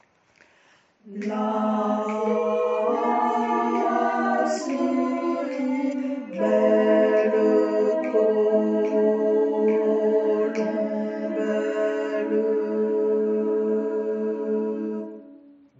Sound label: tutti